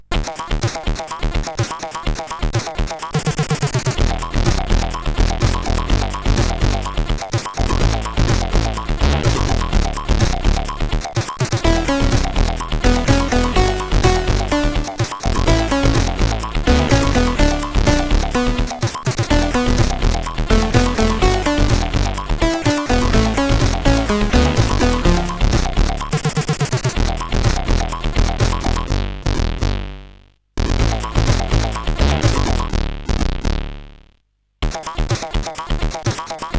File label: Old Chaps, CSG8580R5